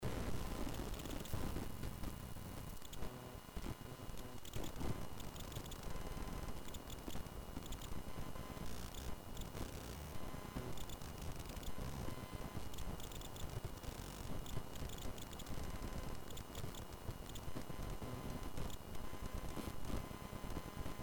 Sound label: noise; dmx 6fire